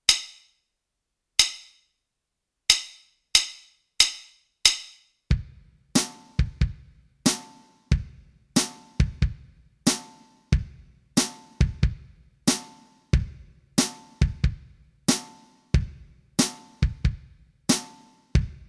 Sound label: Mellow Blues Track